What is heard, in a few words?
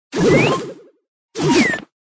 Emote Flower Miner